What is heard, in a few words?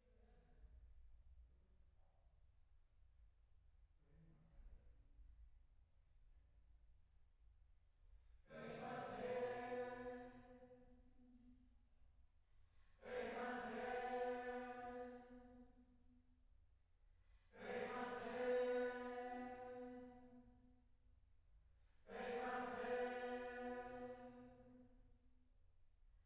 Choir